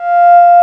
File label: AN SYN, SYN & LEAD; SAMPLE WAVE MIXING 2